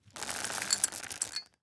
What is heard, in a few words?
dry cat food filling metal bowl